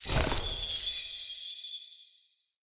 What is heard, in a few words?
UI Vendor Purchase Confirm